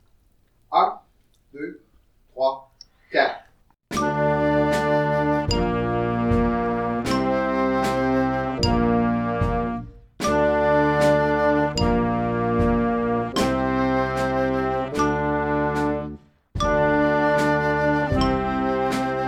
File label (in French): pont de bois noire a 76